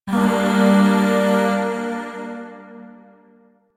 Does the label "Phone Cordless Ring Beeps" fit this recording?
No